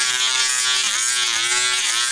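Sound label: bee engine; vehicles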